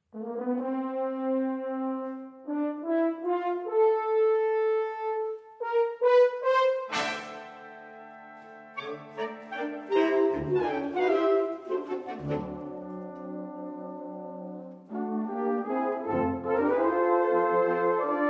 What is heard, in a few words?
Appassionato, Fanfare; Het hoofdthema is gebaseerd op een aantal betekenissen van de term Appassionato (2006): hartstochtelijk en enthousiast.